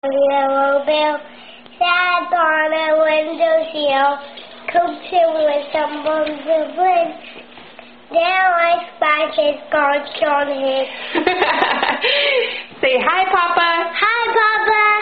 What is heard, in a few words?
3 Year Old Singing; Pretty Little Bird; Bird With A Yellow Bill On A Window Sill